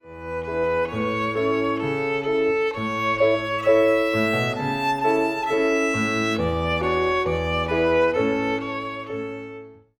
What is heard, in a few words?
VIOLIN